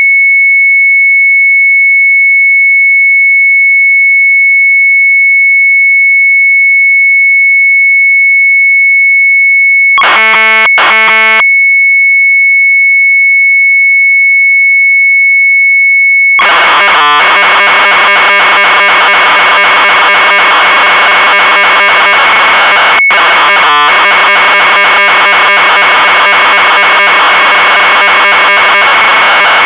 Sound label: Sharp MZ800 Tape